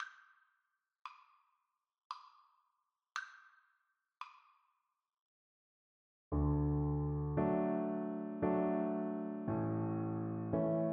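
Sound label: VLC karaoke, Cello; afton water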